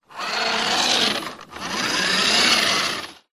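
leisure radio controlled car off push carpet short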